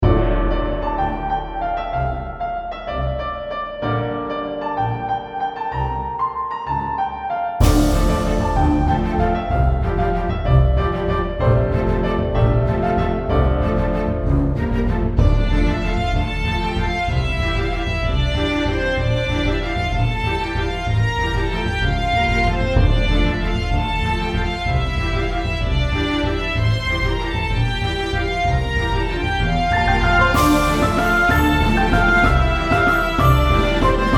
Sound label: Orchestral Version